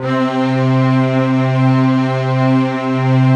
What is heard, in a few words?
huge stack; Greytsounds; Scott Peers Sound Engineering